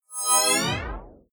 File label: screen shut down whoosh; energie